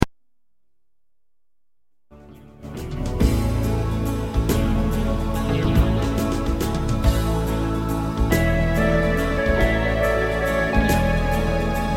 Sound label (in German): country walz